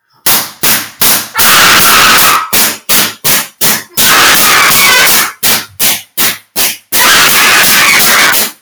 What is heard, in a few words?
clapping bass boosted